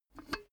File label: cash box metal lid open; maschinen; soundpool